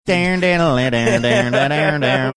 singing portion of theme song; friends

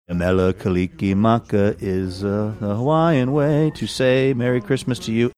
singing

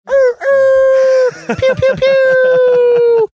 making air horn ray gun sounds

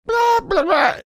imitating marsupial gurgle sound

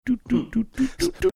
making slowly stepping backwards sounds

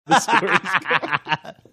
awesome laugh

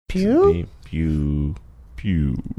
making ray gun like air horn sounds

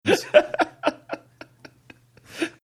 chuckling

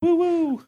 woo woo

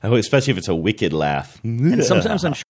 especially wicked laugh

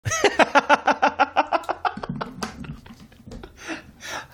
awesome laugh

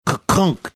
mimicking mechanical sound cassette players

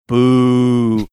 booing

